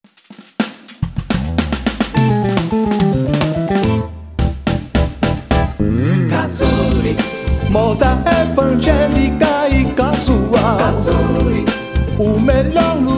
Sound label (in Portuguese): jingles